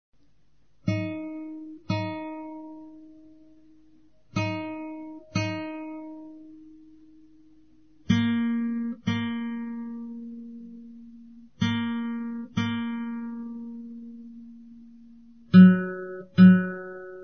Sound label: tuning